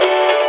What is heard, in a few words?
stabs hits; Melodic Stabs and Hits